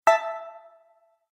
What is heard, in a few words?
Bright Sound Video Game